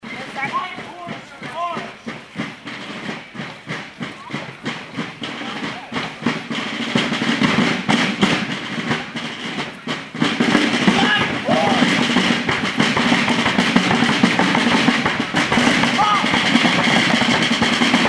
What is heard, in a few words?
Fife and Drum